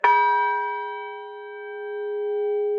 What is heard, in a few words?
horsham, bells